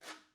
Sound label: guiro short